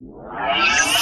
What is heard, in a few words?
Round Modulated Transition